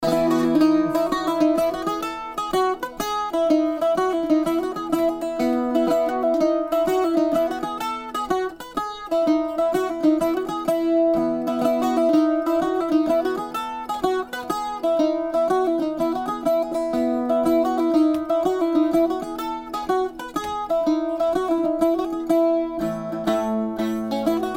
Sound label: Polska